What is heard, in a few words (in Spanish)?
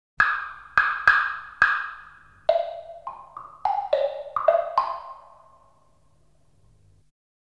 Sonido de caja china; Instrumentos de percusion; Percusion indeterminada; madera